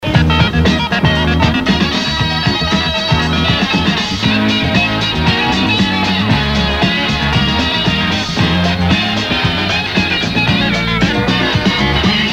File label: jingle